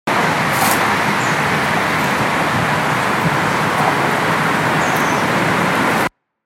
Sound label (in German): vogel